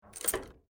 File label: Lock Layer Down; my cute commissar 2020 gamerip